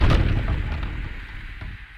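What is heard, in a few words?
Music Volcano Light 120; From Dust; PS3; Xbox 360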